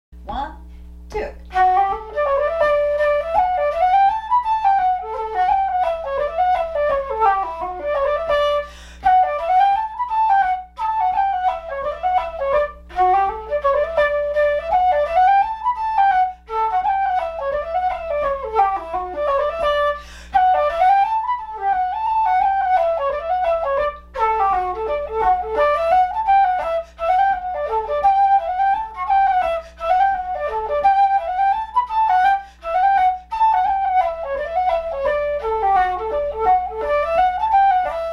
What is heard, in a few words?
SOLO flute